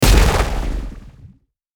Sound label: weapon blast; Default Charge Rifle Blast